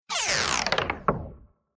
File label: Spin Down Wood Squeak High Pitched Scifi Future Alien; Sound Design; Tech Sound Effects